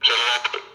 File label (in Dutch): ze lacht; hooligan